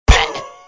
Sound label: Pigglette death; Angry Birds Space